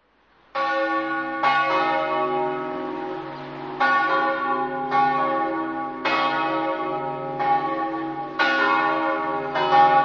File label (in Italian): romans, campane